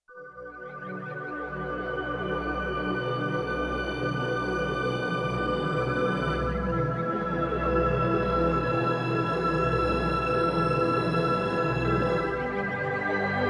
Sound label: Waterspace low, Spacey Keys, Yamaha TX16W